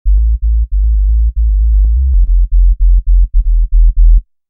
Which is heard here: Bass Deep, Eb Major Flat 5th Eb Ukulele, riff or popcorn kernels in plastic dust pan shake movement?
Bass Deep